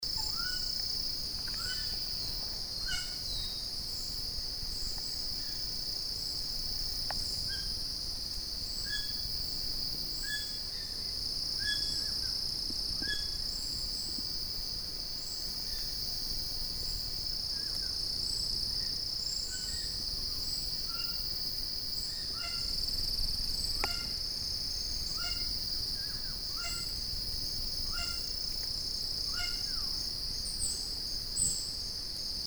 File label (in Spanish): Papagayo Moluqueno